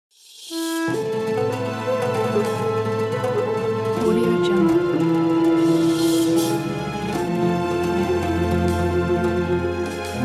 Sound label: eid al fitr logo